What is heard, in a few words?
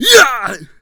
Melee, Melee Grunts, Human Punk; zps; Humans